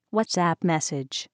WhatsApp Iphone Ringtone

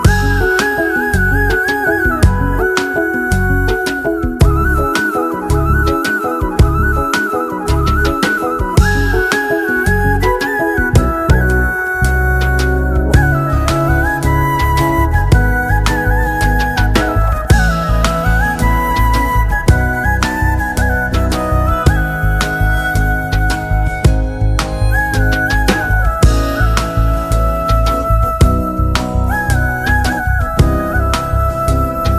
Instrumental Ringtone

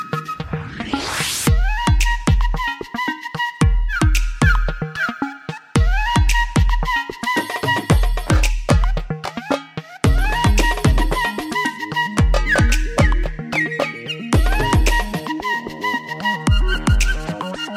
Instrumental

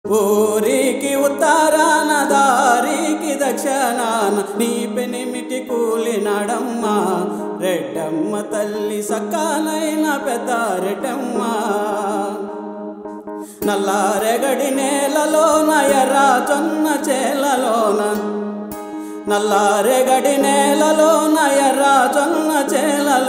Male Version Ringtone

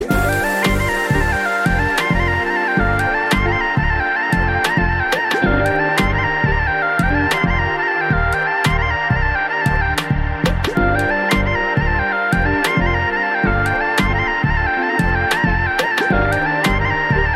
Love Bgm

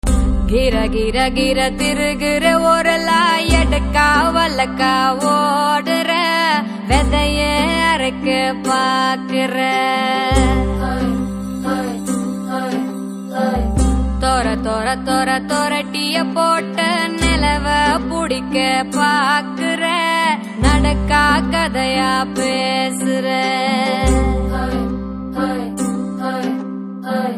Slow Bit Ringtone

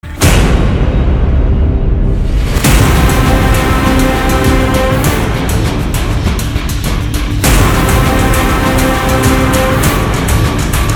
Action Bgm; Mufthi

